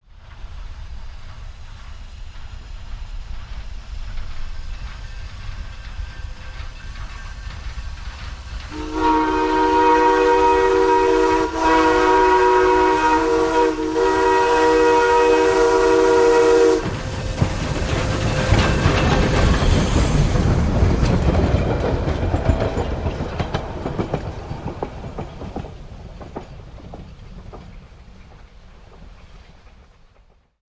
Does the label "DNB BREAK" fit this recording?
No